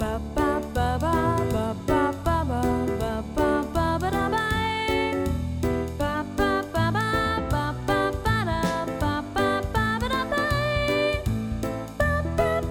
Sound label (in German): Thursday Kanon vokal